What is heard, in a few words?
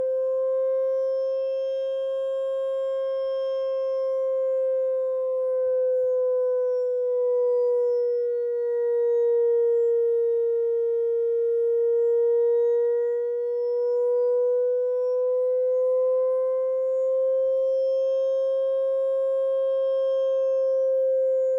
Vessie Ballon Gant ramps from 500Hz to 2000Hz IN OUT SHORT